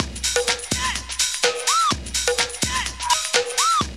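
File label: drum loop; X-Static Goldmine 3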